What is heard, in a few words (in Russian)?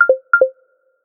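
звук предупреждения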